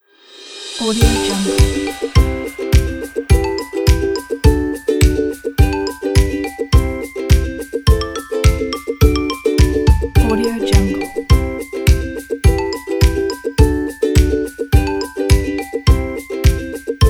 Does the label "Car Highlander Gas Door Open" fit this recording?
No